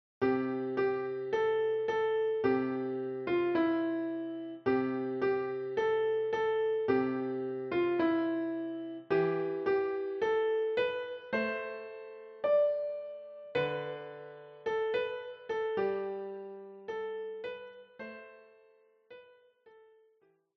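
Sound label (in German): We Shall Overcome, Klavier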